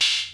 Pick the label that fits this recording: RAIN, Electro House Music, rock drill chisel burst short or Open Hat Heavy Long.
Open Hat Heavy Long